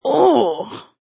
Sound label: Grandma, Old lady discomfort grunt; Grunts; Voice